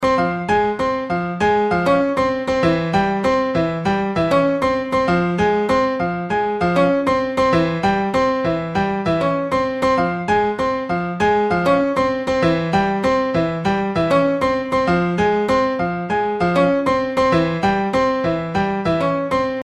salsa ringtone

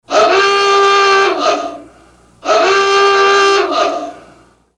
ahooga horn sound

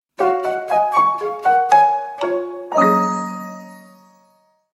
sms anime

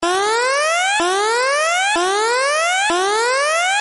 Alarm Ringtone

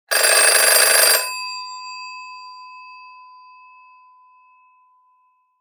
phone ringing sound ringtone

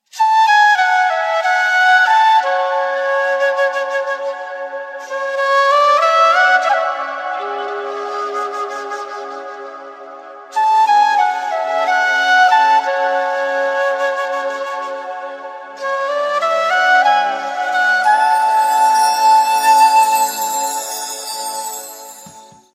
flute krishna ringtone

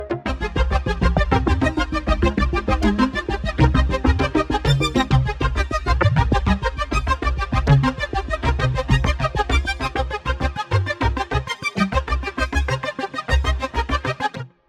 electric chaos ringtone

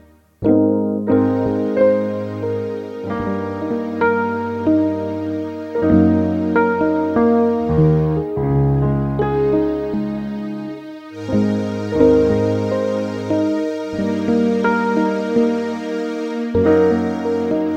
quiet walk

